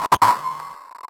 Broken Snare Fx; Zero-G; Soundclash

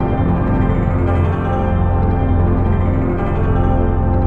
Floating Harp

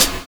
LAUGH; Univers Sons

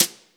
BRUSHES

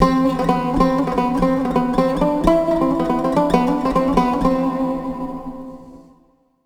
SIERRA GUI; Best Service Advanced Media Trax Building Blocks

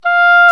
Oboe f; Roland L-CDX-03 Disk 1; Oboe 4-8

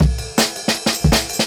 162, Jungle Breaks; Original Jungle Breaks

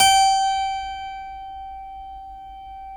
G 2 CLAV; USB Soundscan vol.17

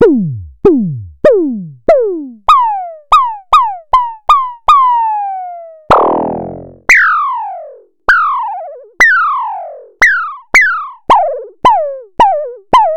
Synare-3; Star-Instruments

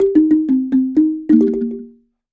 Marimba 100bpm Eb end, African; Quazibeat; African Secret

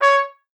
STAF C#5, TRUMPET; Session Instruments; Best Service ProSamples vol.42

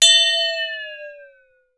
waterbell, water fx

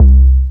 DM BAS, Dance Mega Synth

